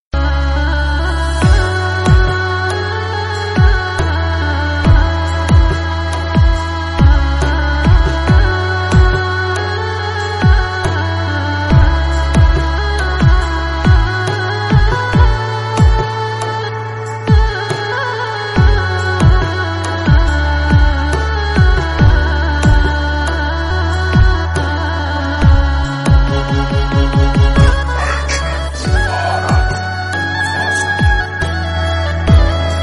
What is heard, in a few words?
turkish, bgm, theme, sad, instrumental